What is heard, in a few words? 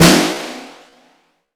SNR, Drums; Acoustic and Electric; Polyend; Simulacrum Sounds; 1 Shots